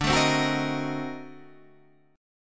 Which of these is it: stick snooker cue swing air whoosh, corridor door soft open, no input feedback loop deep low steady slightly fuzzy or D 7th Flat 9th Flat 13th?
D 7th Flat 9th Flat 13th